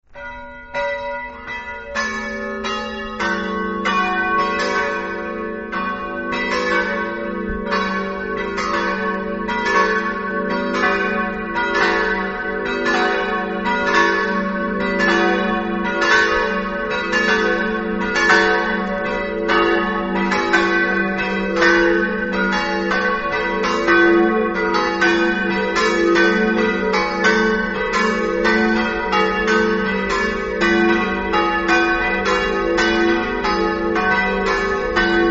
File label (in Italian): bearzi, campane